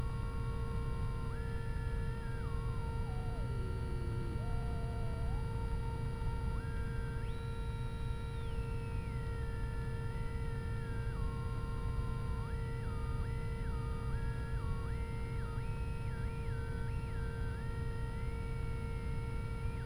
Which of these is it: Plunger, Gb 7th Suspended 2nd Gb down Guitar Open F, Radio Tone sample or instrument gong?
Radio Tone sample